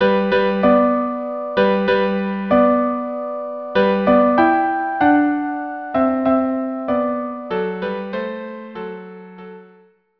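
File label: lullaby violin cello duo; brahms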